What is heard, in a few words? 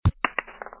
goal bounce; FoosBall